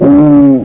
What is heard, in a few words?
hound dying; Marathon Happy Sounds; Marathon Bonus